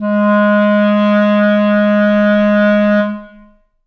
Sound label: reed acoustic, clarinet